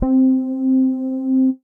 106 Organ, Synth Keys

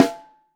Top, Snare; Ableton Foundation FreePacks; Snare Designer

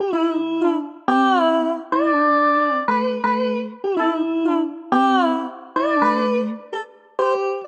Sliced Melodies A Minor 125 bpm, Harmonic Racks; MIDI Clips; Ableton; Factory Packs; Voice Box

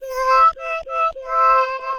Pillow Talk, voices

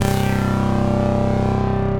Lofi Bass; Drive and Glow; Ableton; Factory Packs

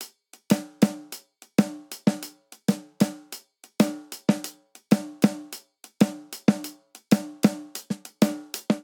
Simple Seven Snare & Hihat 7/8 190 bpm; Ableton; Factory Packs; Drum Booth

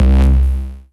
Analog Bass; Aamber; Ableton; Factory Packs; Retro Synths; Analog Bass Vol 1

